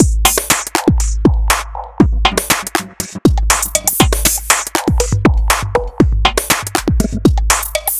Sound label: Step Beat, Drum Loop; Doctor Step Beat; Sonic Foundry (Sony Creative Software); The Electronic Music Manuscript Collection; Drum Loop Structures